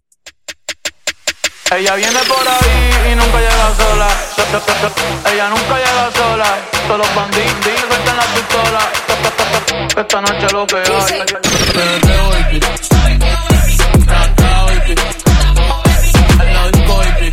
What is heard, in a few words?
Funk, 102Bpm